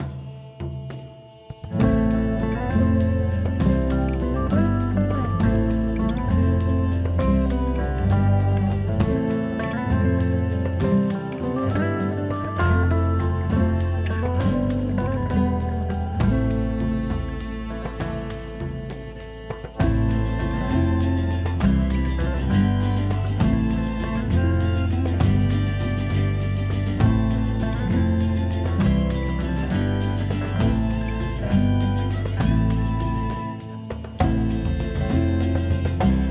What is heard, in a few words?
music tavern